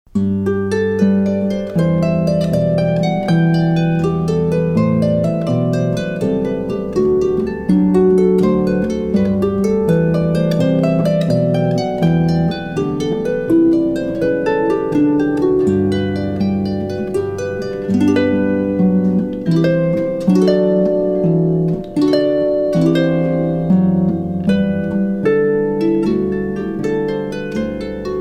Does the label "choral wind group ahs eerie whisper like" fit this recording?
No